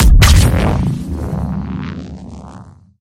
explosion

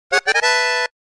stylish sound effect; paper mario the thousand year door

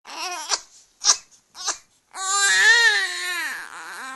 crying notification sound

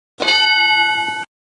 correct answer

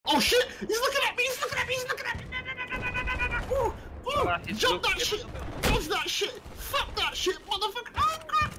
frozen squeaky rapping

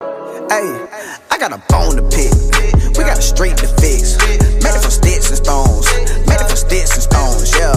phew

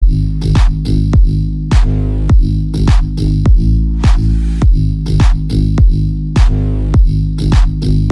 bass boosted

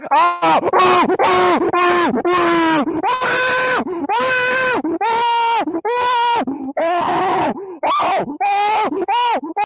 scream stream

